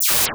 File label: dispersion